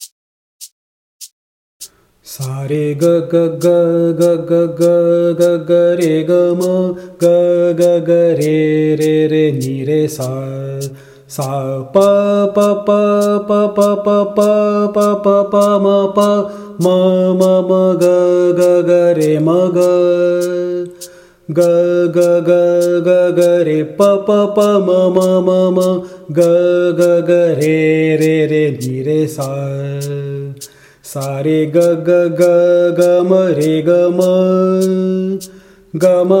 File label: Indian National anthem vocal; Bansuri Student Zone